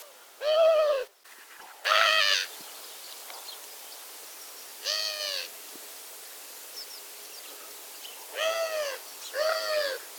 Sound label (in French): tourterelle turque appel